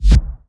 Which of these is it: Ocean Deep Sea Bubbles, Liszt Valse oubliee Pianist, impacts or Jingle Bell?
impacts